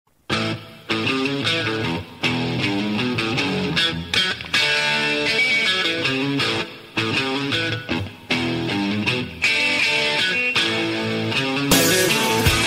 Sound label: dzwonek ringtone